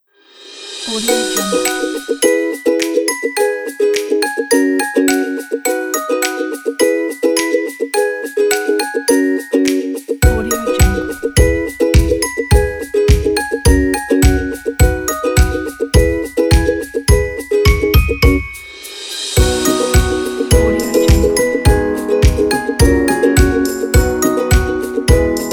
happy smile; brownbird

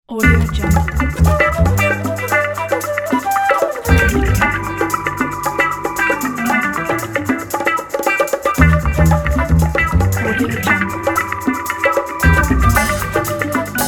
funky flute ident

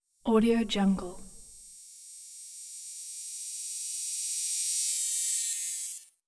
rising thin electric buzz

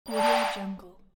interface swoosh

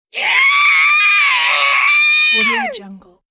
female anger frustration scream

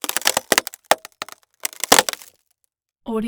wood panel crack

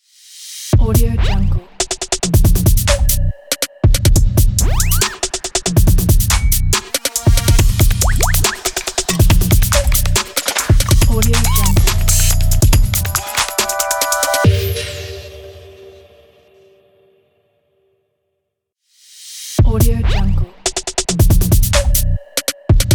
podcast opener logo; sviridpavlov

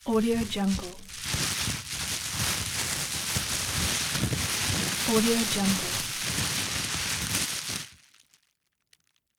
crumpling plastic bag

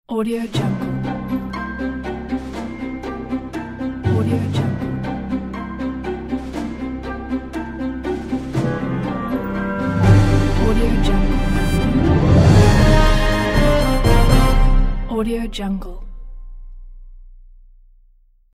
orchestral clock logo

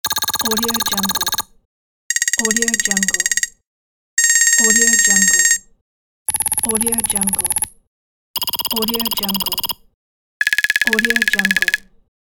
score count pack

